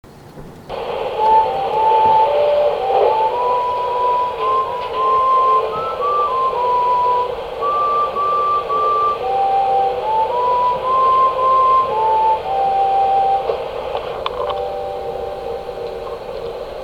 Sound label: hot7000 chime